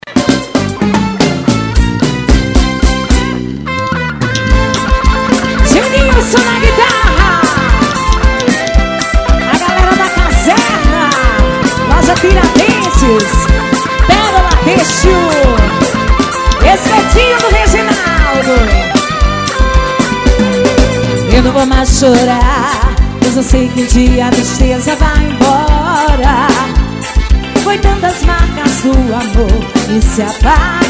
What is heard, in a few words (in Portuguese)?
forro universitario